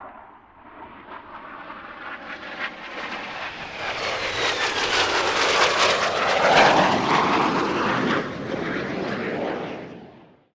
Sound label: fighter pass